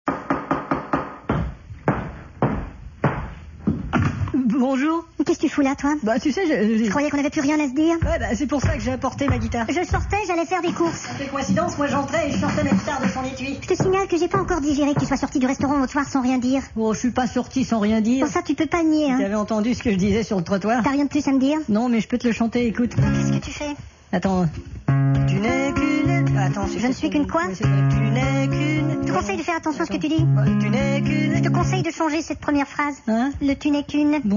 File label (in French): rupture sur solo de guitare